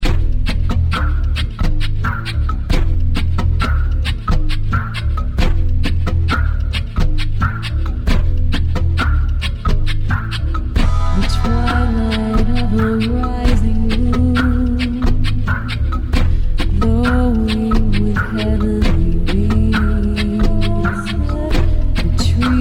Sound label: gnawa; train